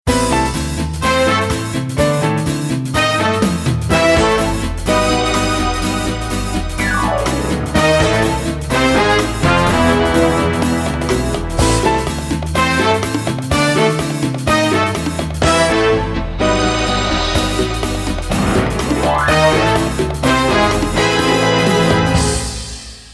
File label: Lowrey Broadway